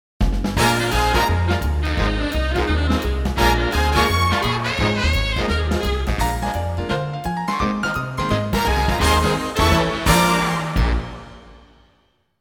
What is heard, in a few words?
Big Band, jazzy